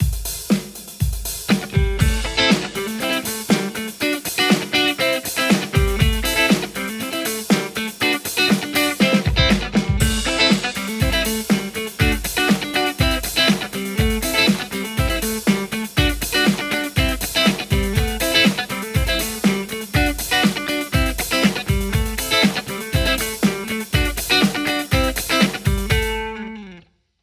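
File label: Telecaster JCM800 hot; mercuriall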